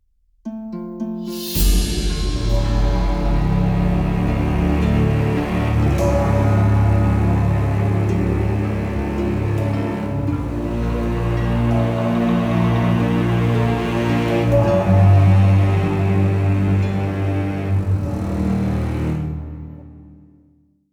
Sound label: Monster Hunting; Skellige